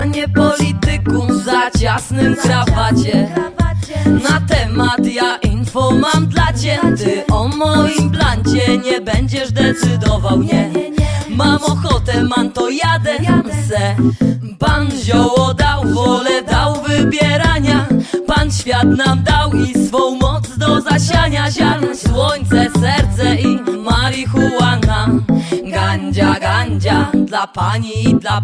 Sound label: "Mmm"